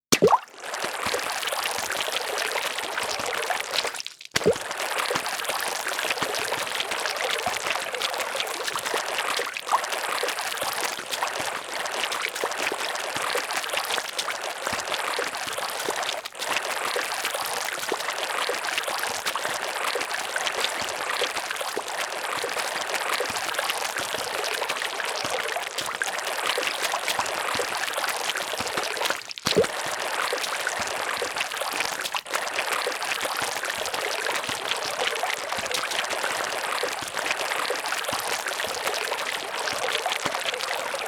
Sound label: Swimming in Ink